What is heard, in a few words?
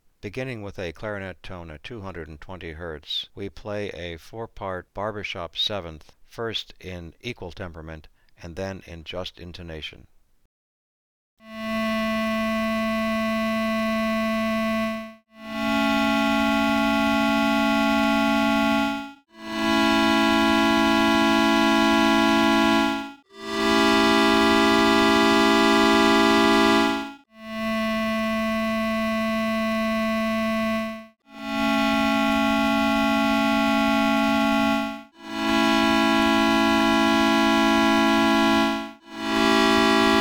clarinet 4567 Equal 4567 Just